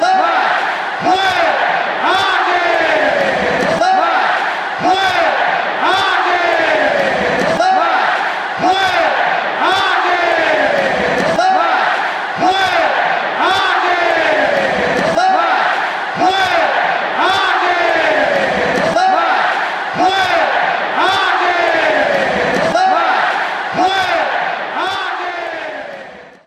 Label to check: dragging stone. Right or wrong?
Wrong